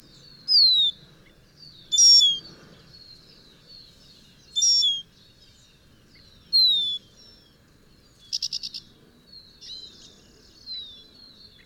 Lesse Goldfinch, Spinus psaltria psaltria; finches

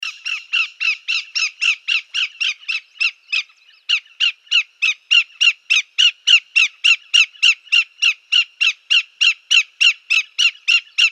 Greater Yellowlegs, Tringa melanoleuca; shorebirds rails

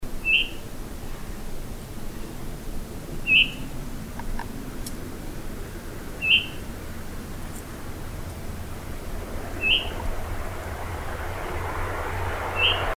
Veery Catharus fuscescens fuscescens; thrushes thrashers

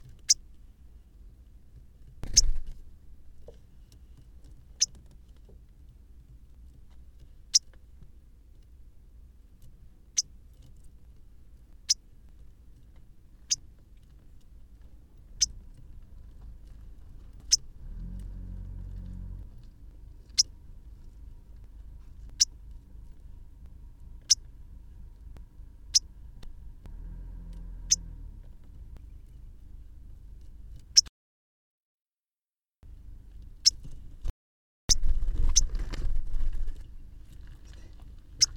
Northern Waterthrush, Parkesia noveboracensis; warblers

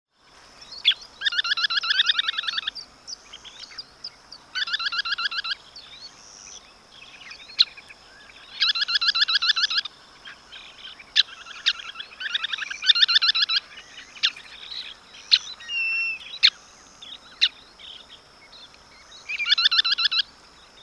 Semipalmated Sandpiper, Calidris pusilla; shorebirds rails

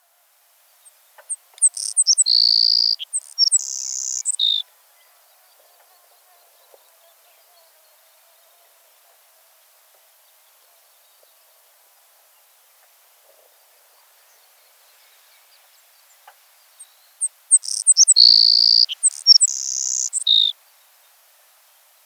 Savannah Sparrow Passerculus sandwichensis; sparrows

